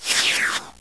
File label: swoosh